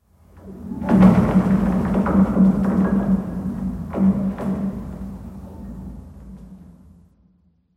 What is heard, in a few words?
amb lowpass ping, ambient; Half Life Model Pack